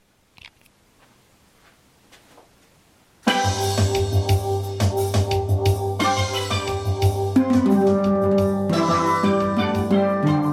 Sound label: guitar gahu E F; gahu-song